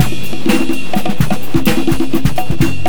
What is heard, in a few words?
ACOUSTIC, Big Beat Drumloops; USB Soundscan vol.25; AKAI